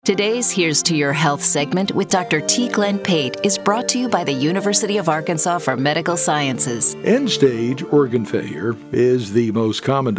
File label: organ